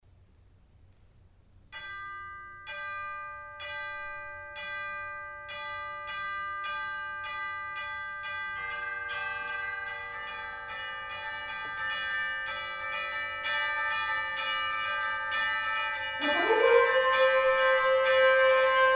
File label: Wind Ensemble, La Fiesta Mexicana; Wind Fest 2019